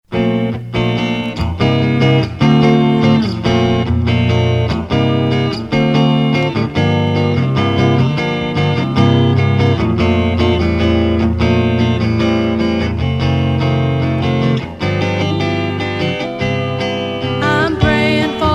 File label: boy scout triangle